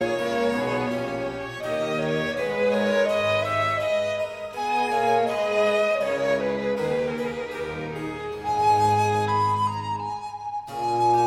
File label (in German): concerto c dur fuer altblockfloete streicher und basso continuo andante